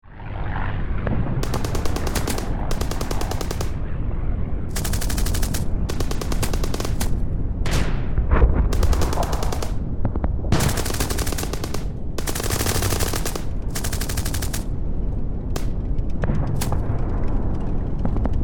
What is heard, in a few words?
Battlefield Ambience Tanks Planes World War Ii; Warfare